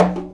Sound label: CONGA, FUNK; InVision Interactive; Percussion Slam